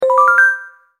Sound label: login